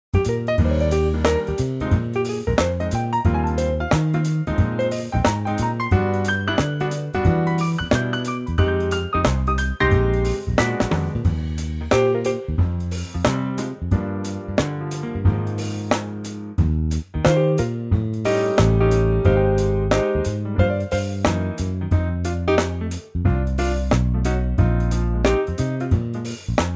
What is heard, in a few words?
funky; jazzville